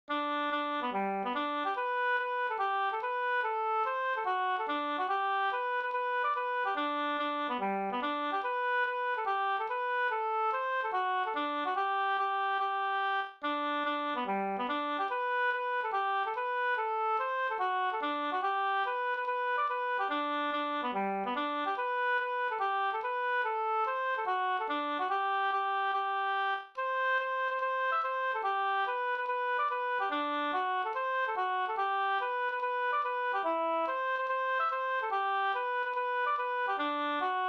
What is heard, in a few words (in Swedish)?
snoa